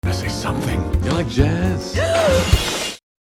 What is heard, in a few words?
jazz